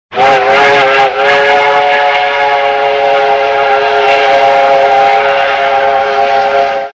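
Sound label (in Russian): гудок поезда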